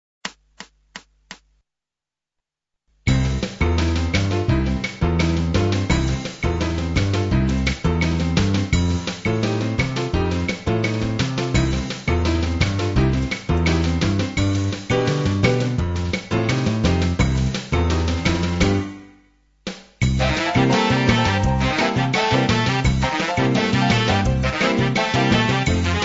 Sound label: guitar backing tracks